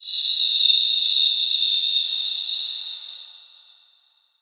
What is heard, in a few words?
CARRIER cell Idle